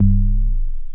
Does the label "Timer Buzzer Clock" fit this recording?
No